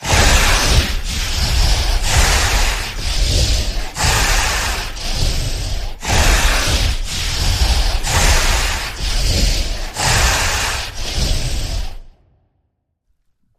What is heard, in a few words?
Werewolf Salivating Breaths